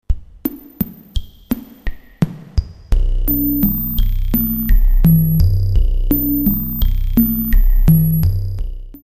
Reso Ticker rev, texture

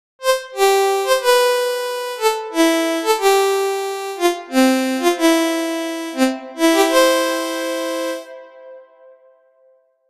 Violin Piece, synthlead

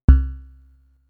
Bell, synthlead

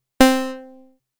Short, synthlead

